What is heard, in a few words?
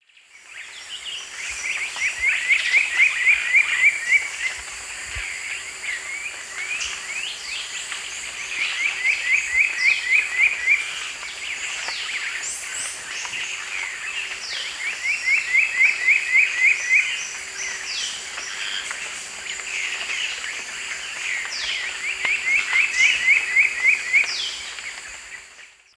Campylorhamphus trochilirostris, calls and song; S. America; Eastern Lowlands